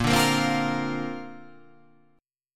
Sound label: A sharp Major Add 9th